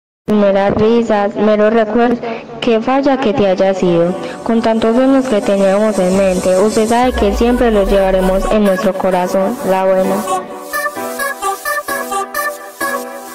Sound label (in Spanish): risas